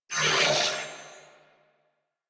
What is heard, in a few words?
Death Grineer Male; New War; Narmer